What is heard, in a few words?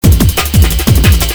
loop